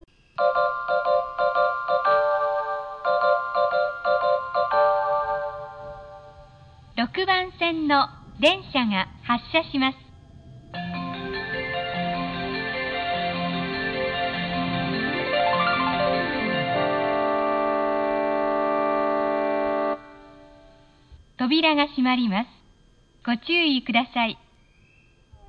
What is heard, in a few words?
melody, station